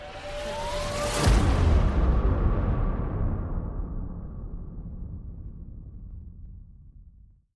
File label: Bass Drop; SoundsCrate